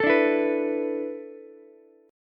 A Suspended 2nd Suspended 4th, down, Ukulele; Asus2sus4 strummed; JGuitar

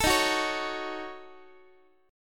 Eb Minor 7th Flat 5th, Guitar; strummed

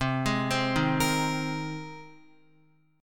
C Minor 7th Sharp 5th C Guitar; Cm7#5 arpeggiated; JGuitar

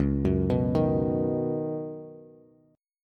Db 7th Suspended 2nd Suspended 4th, Bass; JGuitar

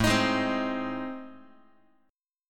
A Minor Major 7th Flat 5th A, Guitar; AmM7b5 strummed; 4A,4E,5A,5D,5G#,6C#; x,5,6,6,4,x

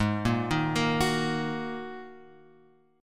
G sharp 7th Suspended 2nd; G#7sus2 Chord {4 1 1 3 X 2} arpeggiated

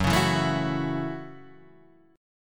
A 6th Gb

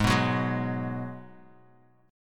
Ab Minor 7th, Guitar, Half Step Down

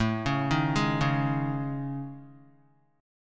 A Major 7th Flat 5th, Guitar, chord; AM7b5 arpeggiated; 4,4,3,1,1,x; JGuitar

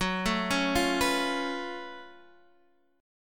F sharp Minor Major 11th, Guitar, Half Step Down

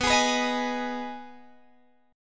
B 6th B down Guitar Open G

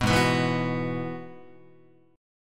G sharp Minor 7th Sharp 5th G sharp down Guitar; G#m7#5 strummed; JGuitar

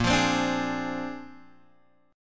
Bb 9th Bb down Guitar Open G

